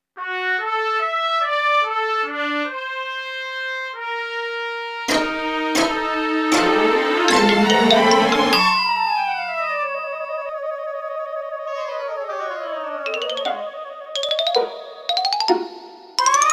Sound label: The Crash Landing; Pikmin 2